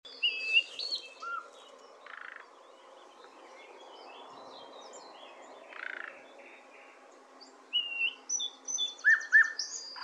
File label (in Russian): solovey luscinia luscinia; nochnyh ptits